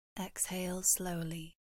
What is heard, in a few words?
exhale